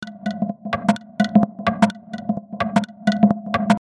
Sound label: Drum Set 128 Groove